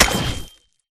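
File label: Impact Pulse Blade Bubble Shield; Titanfall 2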